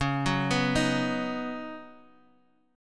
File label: C sharp 7th Suspended 2nd Suspended 4th C sharp Bass Standard; arpeggiated; chord; 21,21,21,20